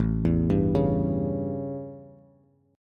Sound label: A 7th Bass, chord; arpeggiated; JGuitar